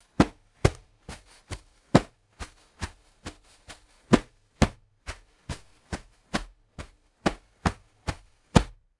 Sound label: muffled hand grabs on fabric; foley